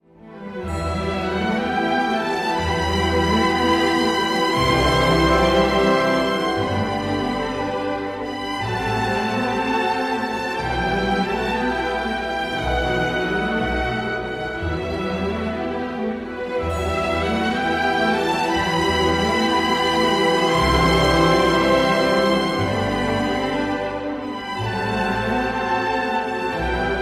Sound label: Bouzoukis